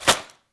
Peg bag, household rhythm samples Hits

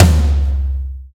NATURAL F, DRY+FAT KIT

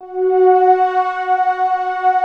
APPROACH, PADS; Masterbits; Vintage Voltage

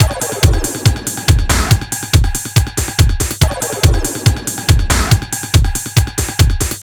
Drum Loops

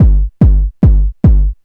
Electronic Four On The Floor, Drums & Percussion; Sonic Foundry (Sony Creative Software); Electronic Point Blank

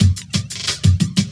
LOOP, Drum & Bass, 180 BPM

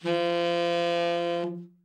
TEN SFT, Sax; TEN-SFT SHRT; Giga Samples Collection

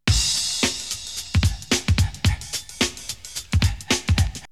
Drumloop; ZERO G - Datafile DISC 1

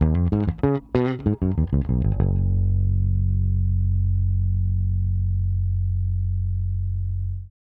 Bass Ending, 70s Analog Funk; Sonic Foundry (Sony Creative Software); What it Is! 70s Analog Funk

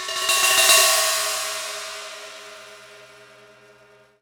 Prepared Drums

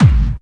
KICK hardcore, Techno Trance DRUMS

